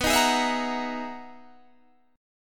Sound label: E Major Sharp 11th B